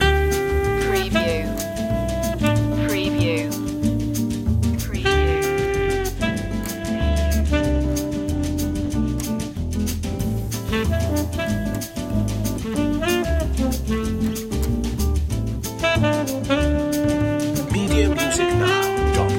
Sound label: call me now loop